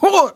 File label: voice male critical